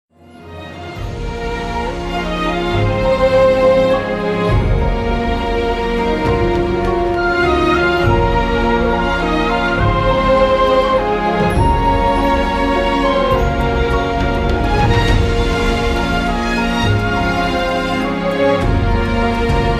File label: Korean Drama Ost